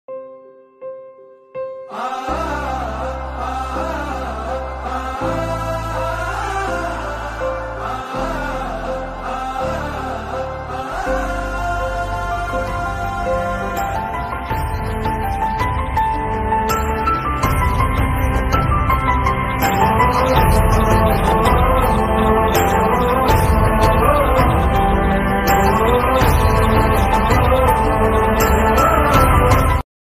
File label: heart touching bgm ringtone